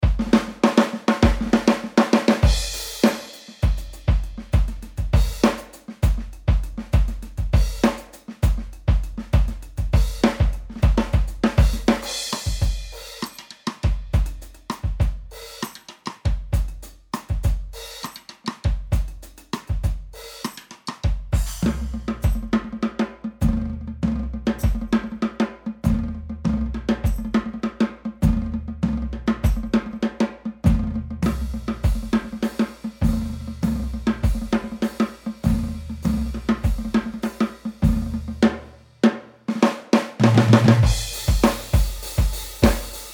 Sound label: handy drums rock legend